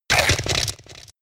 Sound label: Crunch